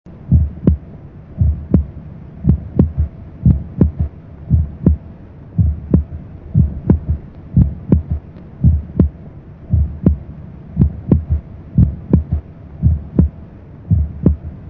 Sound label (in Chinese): 正常第三心音